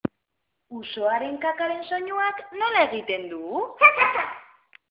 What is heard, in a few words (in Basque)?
buruko usoa